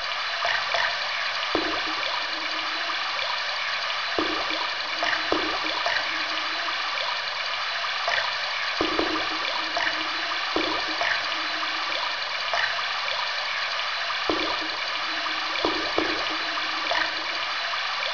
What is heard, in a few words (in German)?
katakomben; de leestore